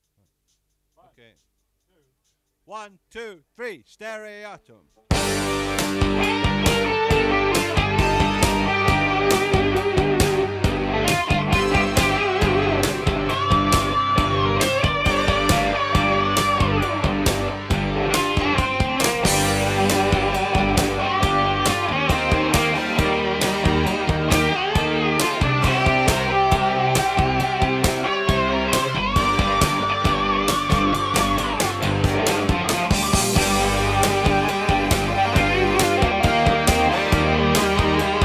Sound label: Backing Rough Mix